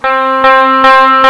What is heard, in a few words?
guitar echo; Sound Effects for Multimedia - Volume 1